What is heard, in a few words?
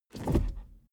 suv push forward gear across fast; verkehr transport; autos